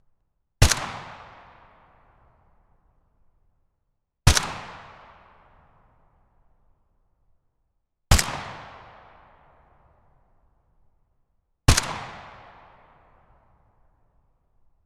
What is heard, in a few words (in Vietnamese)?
Tieng sung ban don le tung phat mot